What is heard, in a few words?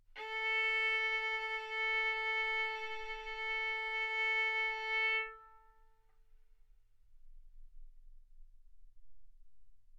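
Violin arco mf A string A4 B4 stereo; sul A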